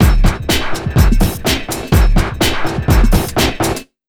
BEA, Big Beat Drumloops

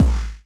BD, Bassdrums, Roland TD-20

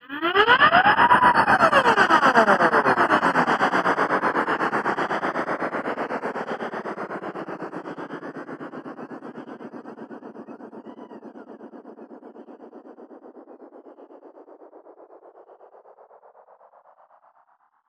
flange away; One Shot FX; Sample Magic; Transmission-X

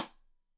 Side Stick Stereo; Deeply Sampled Drums

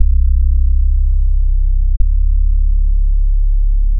Hum Low Bass; Sonic Foundry (Sony Creative Software); The Electronic Music Manuscript Collection; Bass Foundations

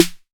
808 SD Tape, Snare Drum; Tape808; Goldbaby Tape808 & Tape909

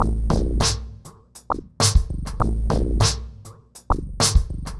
Remixed Drums 100BPM; The Roots Drumloops

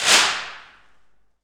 PRC ALMWA, Aluminum Wall; Rhythm Section; FX Perc

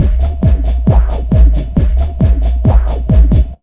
Big Beat Drumloops, LOW 135

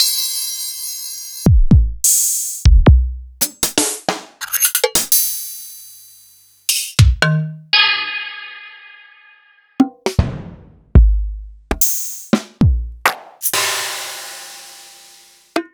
EMU Orbit

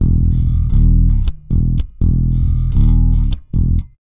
Rock, Bass; Bass X

